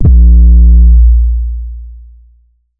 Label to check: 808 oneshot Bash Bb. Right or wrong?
Right